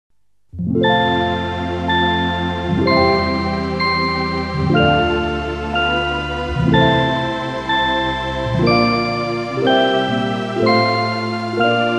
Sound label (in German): Sendezeichen